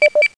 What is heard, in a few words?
Sound Beep